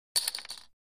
Poker Chips Tossed; casino games; chip mvmt

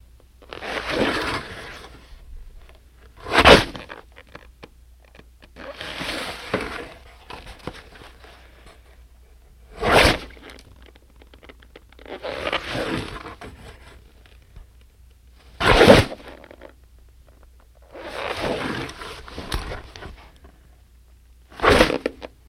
Rifle Leather Holst, leather mvmt

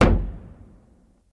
Staff Hit Single, spears & staffs

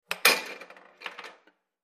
Air Hockey Puck Hit

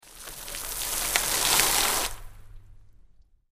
Large Sea Sprays Fall, bow wash

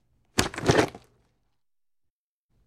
Football Helmet Pic, football

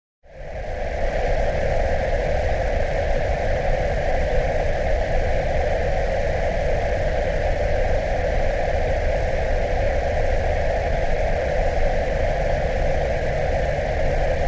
Noise Unsettling Dis, noise

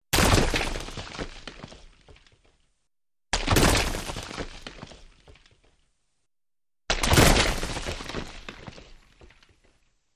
Big Rock Hits, 3x; rocks

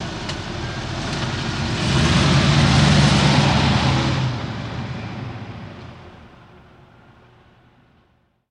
Cadillac In Gear And Away Medium

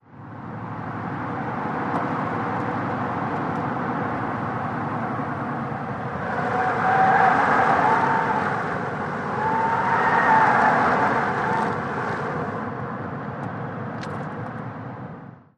Car Cornering, compact

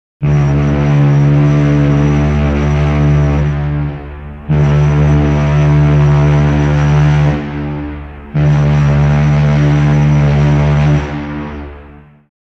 Ship Horn, boat horns

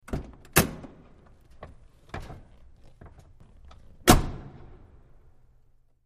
Lincoln Towncar Door

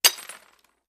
Glass Breaks 2

